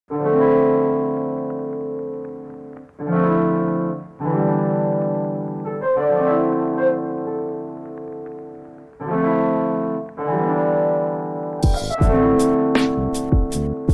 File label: keys